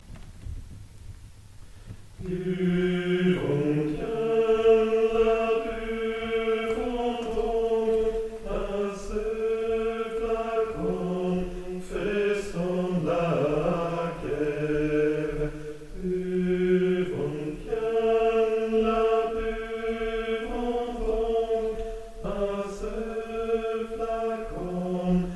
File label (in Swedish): tenor samtliga eko